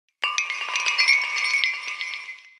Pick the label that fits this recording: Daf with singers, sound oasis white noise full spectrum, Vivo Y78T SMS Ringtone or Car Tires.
Vivo Y78T SMS Ringtone